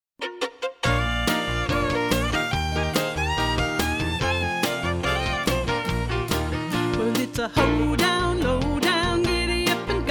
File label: Hoedown